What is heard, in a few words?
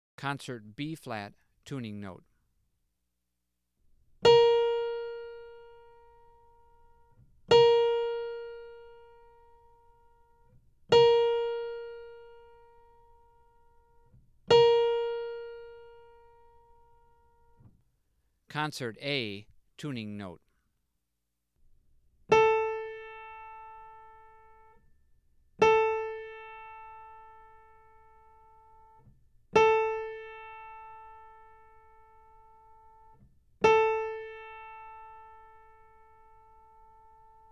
Tuning Notes; James „Jamey“ Aebersold Play-Alongs